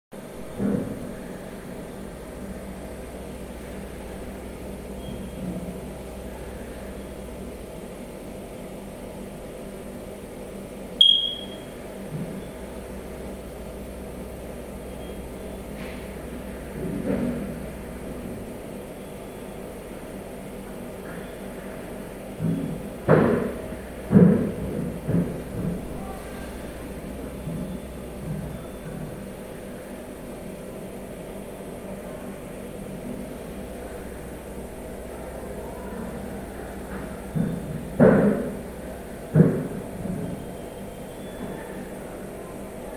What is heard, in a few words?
Error signal 故障信号